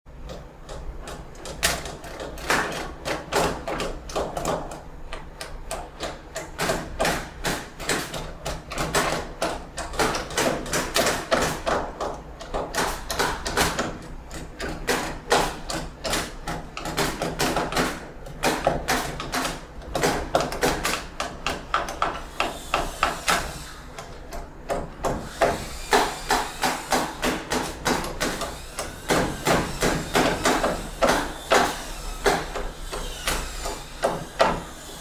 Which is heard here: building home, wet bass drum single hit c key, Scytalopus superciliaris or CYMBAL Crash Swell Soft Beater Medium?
building home